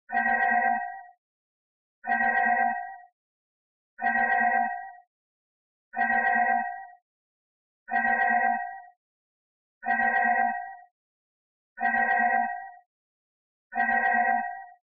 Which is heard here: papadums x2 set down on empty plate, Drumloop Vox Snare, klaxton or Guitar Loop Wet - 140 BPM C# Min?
klaxton